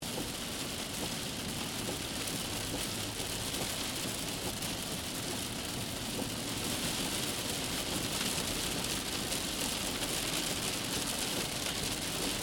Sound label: Transportation, Automobile, Car, Interior, Drive, Expressway, Rain, Loop; Car Sound Effects; BlastWave